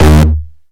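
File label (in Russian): кнопка клик резкий звонкий близкий долгий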